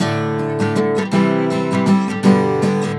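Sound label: guitar, Celtic Flavours; USB Soundscan vol.42; AKAI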